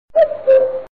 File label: Cuckoo